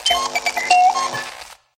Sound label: Craft